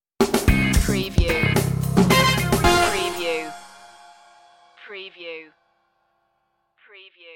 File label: groove stinger